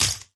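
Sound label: quiet light tinkle of broken glass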